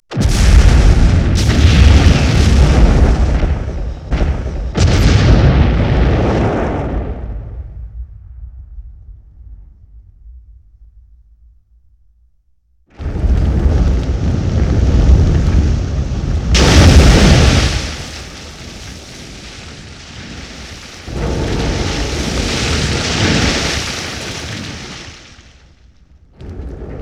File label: Explosion, 5.1 Surround